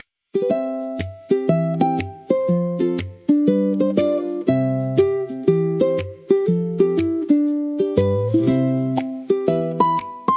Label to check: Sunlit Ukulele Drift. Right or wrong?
Right